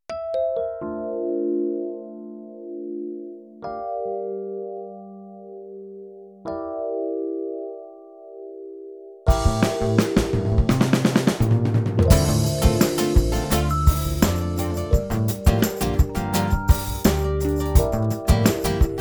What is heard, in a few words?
Accoustic